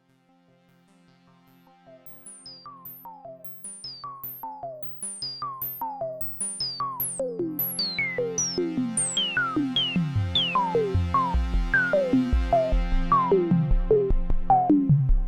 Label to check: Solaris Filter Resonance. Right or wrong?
Right